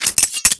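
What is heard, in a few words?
backstab, butterfly knife; weapons; cstrike; csgo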